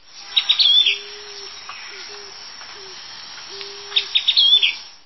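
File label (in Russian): пение мухоловки в лесу совместно с другими птицами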